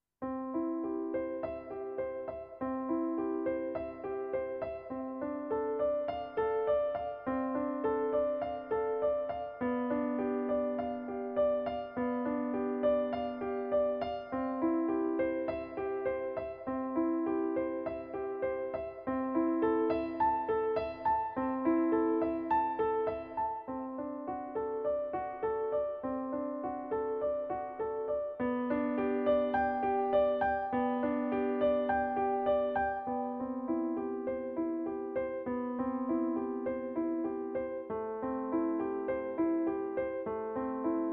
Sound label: Grand Piano 1 corda